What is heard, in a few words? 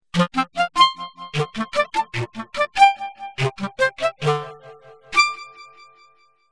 Clarinet